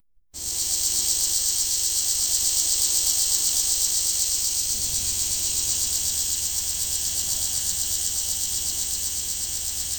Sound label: smooth flowing, shimmering